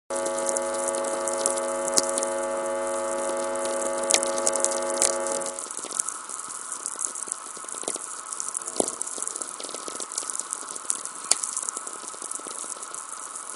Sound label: hum